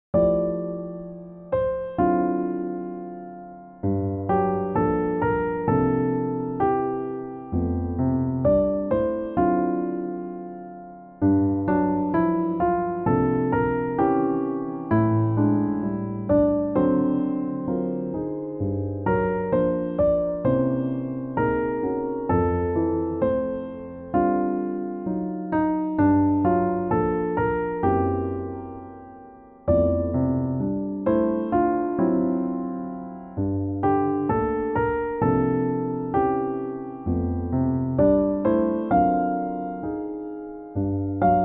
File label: Jazz Ballad